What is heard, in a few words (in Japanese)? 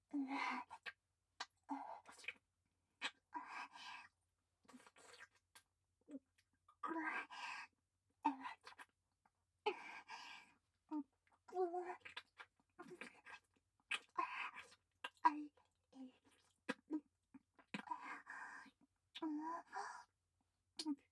フェラ音